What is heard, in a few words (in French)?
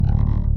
pouet; duke fartinson